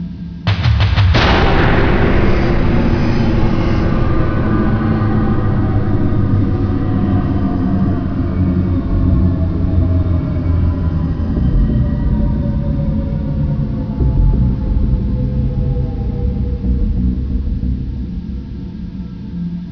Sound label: defeat, music zerg; StarCraft v2.2.2